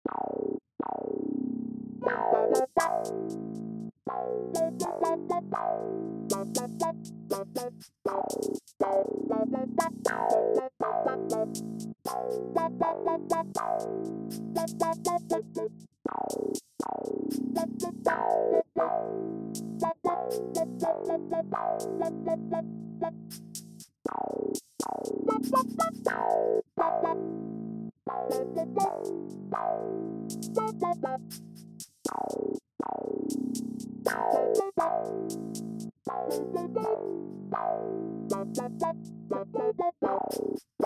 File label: piano funky; Berlin